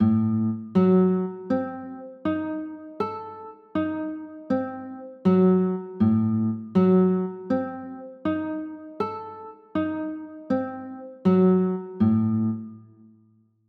d sharp dim7, chords

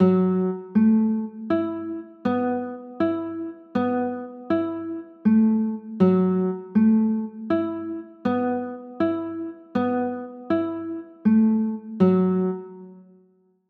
f sharp m11

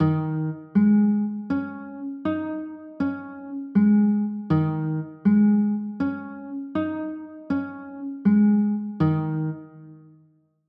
d sharp 7sus4, chords

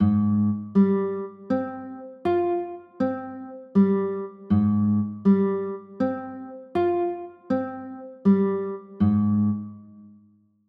a flat maj13, chords

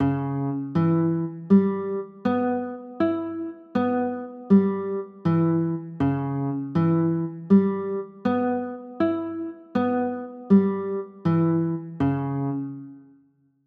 c sharp m7b5

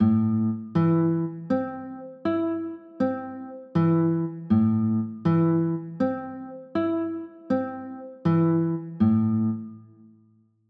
a m, chords

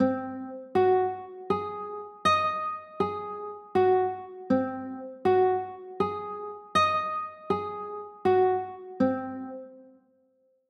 a flat 6; pos 13

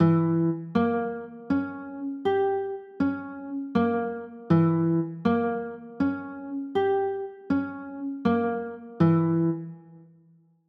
d flat dim7, chords

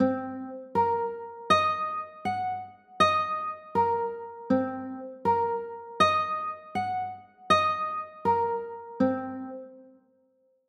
c m7b5, chords

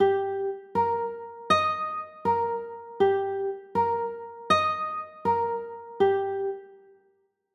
e flat maj, chords

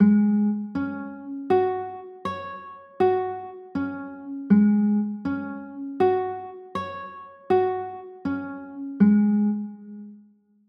d flat sus4, chords

